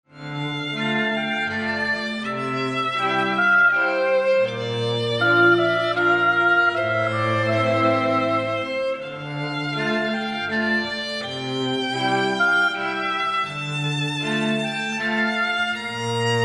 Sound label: instrumental